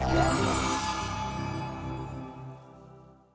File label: level start